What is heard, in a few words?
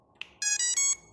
Ringtone Ringtone